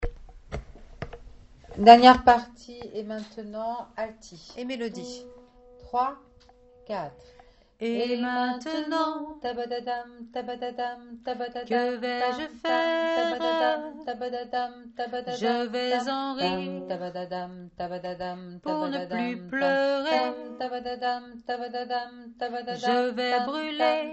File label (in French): melodie basse et alto